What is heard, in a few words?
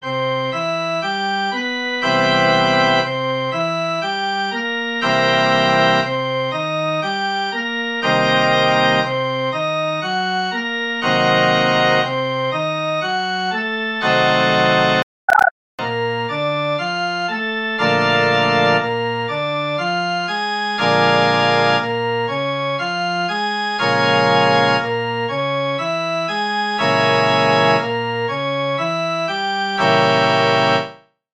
7th Chord Qualities